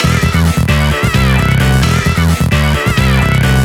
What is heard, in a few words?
Root E 131 BPM; Vengeance Samples; VEH3 Special Sounds